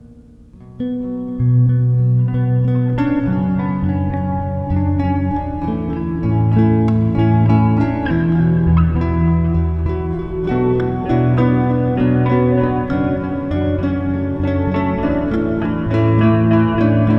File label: Yacht Rock